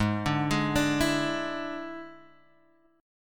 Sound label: E 7th Ab, guitar